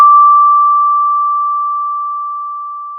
pop, Sound of a school bell

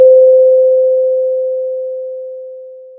Sound of a doorbell

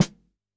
Snr, drum

